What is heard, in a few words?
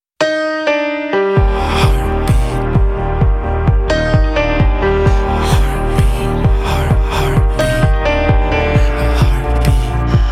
Heart Beat